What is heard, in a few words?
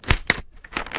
card flip; Embalming Girl